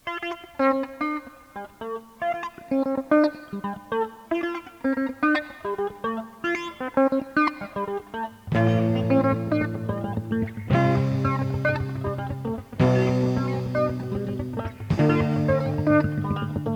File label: Funk dusk